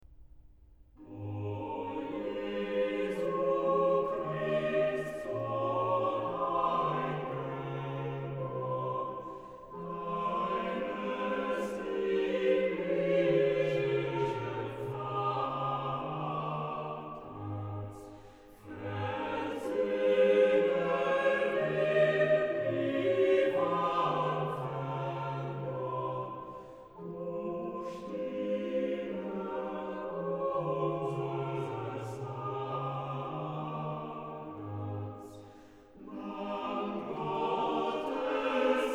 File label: Chorale, organ; BWV0260; O Jesu Christ, Sohn eingeborn; BACH; BACH ORGAN WORKS; CHORALE PRELUDES